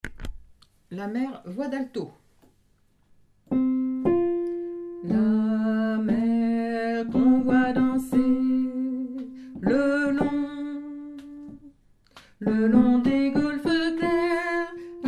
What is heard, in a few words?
alto